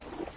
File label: splash; minetest; mob-engine; creatures